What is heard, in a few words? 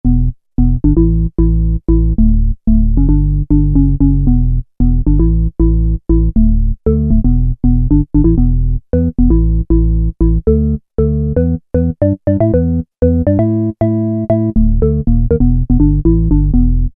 Organ Bass, Alesis Z2 EuroDance